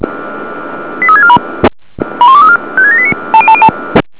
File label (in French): ZWEI, signaux